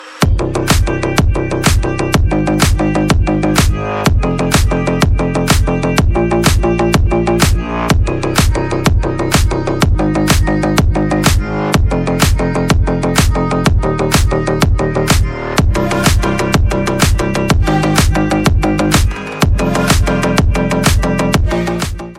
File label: Electronic BGM Ringtone